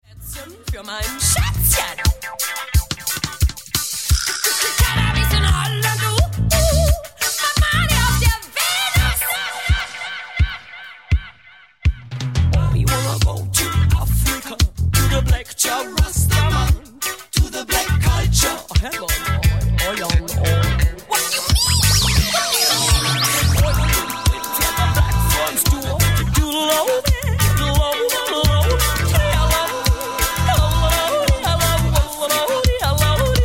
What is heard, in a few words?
African Reggae